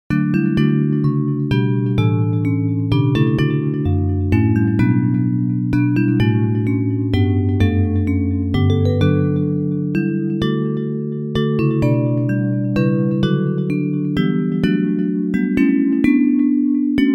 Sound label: Bells